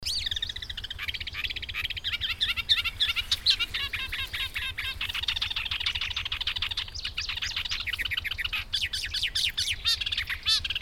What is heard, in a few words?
warbler thick billed Iduna aedon